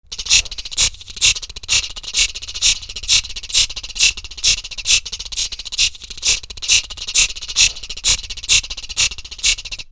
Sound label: Rumble Loop